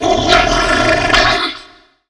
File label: spit; Wolfenstein Demo; Loogie